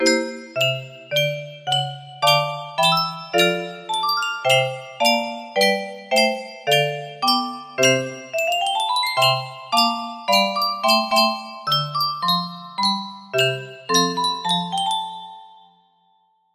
melody, music box

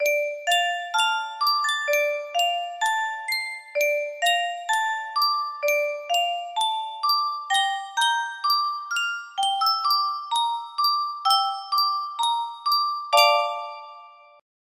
Yunsheng music box

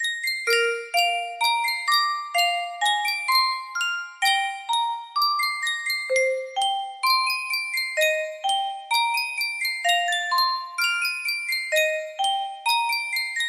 Sankyo music box, music box melody

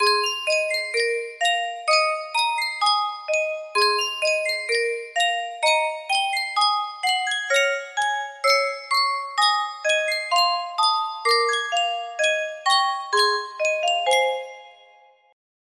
Sankyo Music Box, music box melody

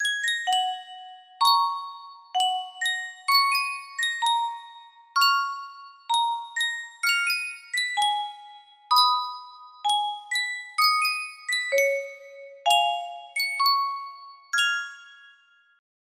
sankyo custom tune music box

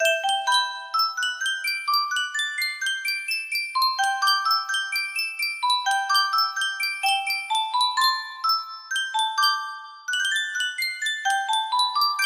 Sankyo Music Box; final fantasy xiv

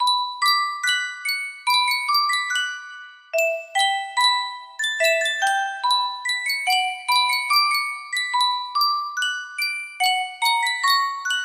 Yunsheng custom tune music box

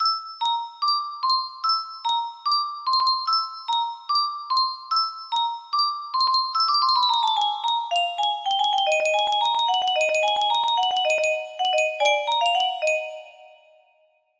ting tang, music box melody

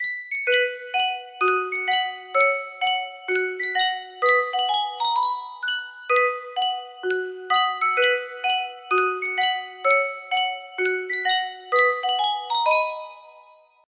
Yunsheng music box

